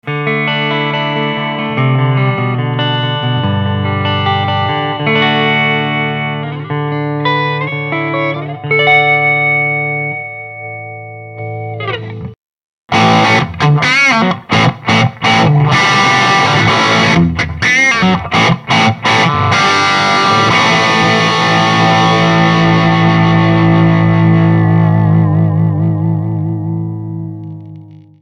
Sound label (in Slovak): bassman gitara